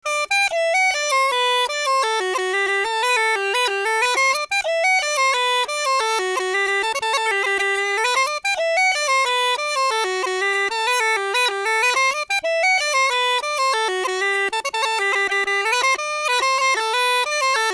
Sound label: pipe jig